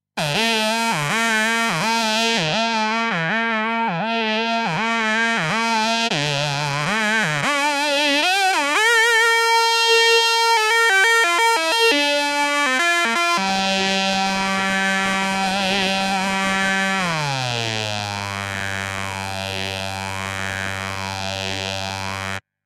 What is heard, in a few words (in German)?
Accordion mit Controller und Phaser